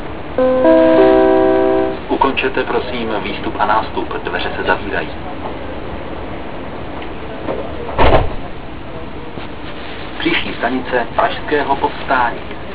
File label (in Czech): odjezd, metro